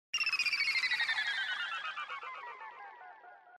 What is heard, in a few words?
8bit going down